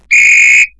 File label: whistle